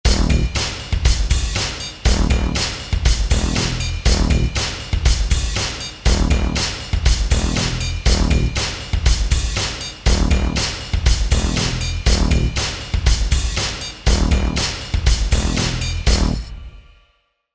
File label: Rap Hip Hop